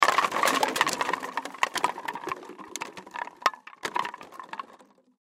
Bottles Spill Dump, Bottles Spill Dump Out Garbage Bag; Drop Sound Effects; Foley; Objects; Blastwave